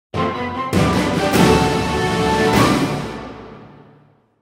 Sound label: Quest Accepted